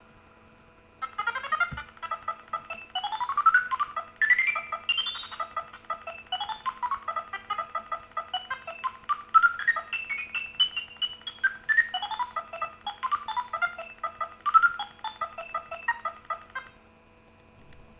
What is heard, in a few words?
With One Note Manual; Google; dom sonification